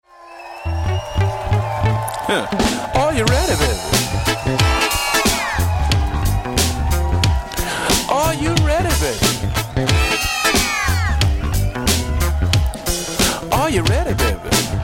funky

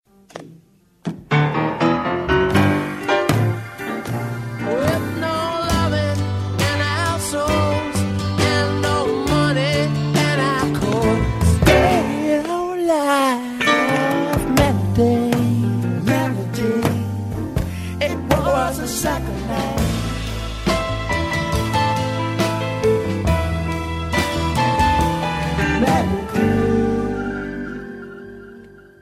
melody mix

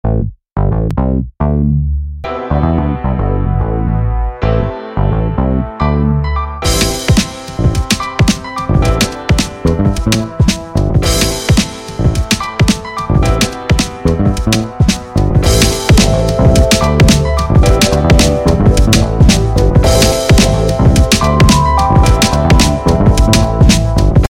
groove

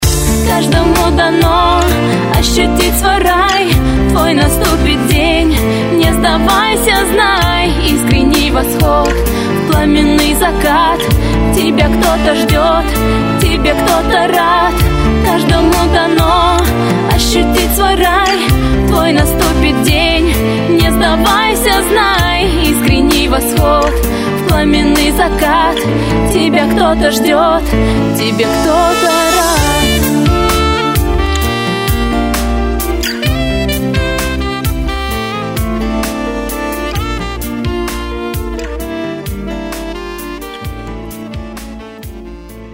synthetic sax

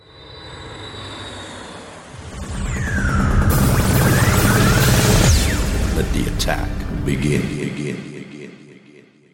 attack begin

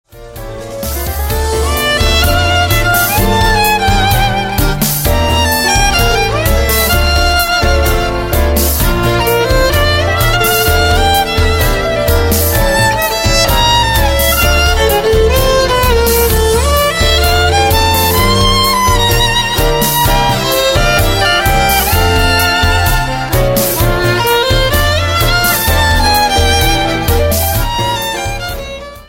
tango

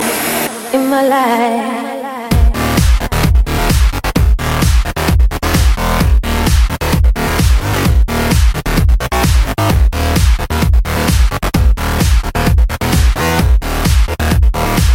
electro

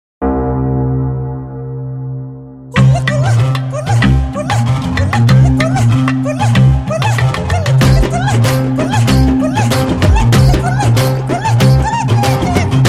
new funky ringtone

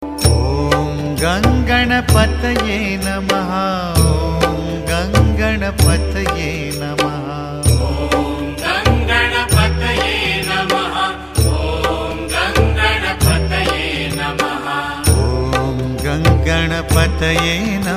ganesh mantra

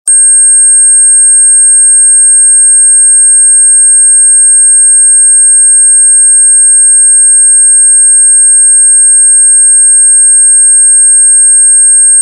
irritating sound beep

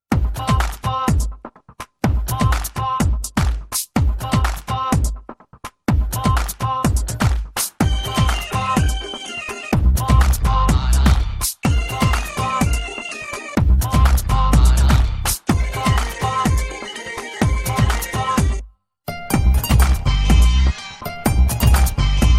remix tone; shri 420

